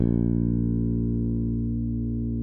SPEC SUSTAIN, Bass

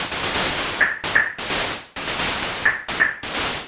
Gated Seq Noise, Gate Filtered 130BPM; noise hiss crackle

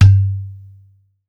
udo low, Yamaha Motif ES drum machine; 300 Drum Machines; Yamaha Motif ES-Series

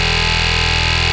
RAVE BEEP; Best Service Alpha Dance vol.2

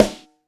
WET, SNARES; Back In Time Records; Big Bang

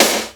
CRACKED SN1R, Techno Trance; E-MU Formula 4000 Series Vol. 2

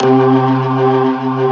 C3, Keyboards Old School; USB Soundscan vol.09; AKAI; BIG HAMM

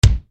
KICK, Yamaha RY-30

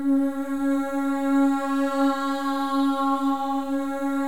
MOVING V3, Symphony of Voices; Spectrasonics

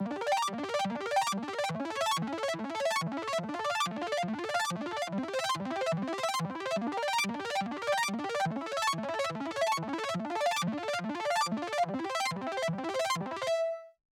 PWM Kit 142BPM Verse - Crazy Arp DRY, Gmin; Vengeance - Essential Clubsounds Vol.5; Melody & Synth

